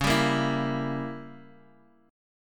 C sharp Diminished 7th C sharp, Guitar Half Step Down